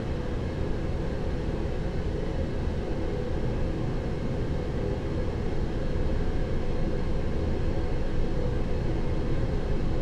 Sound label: amb loop staircase general